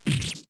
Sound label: anime slash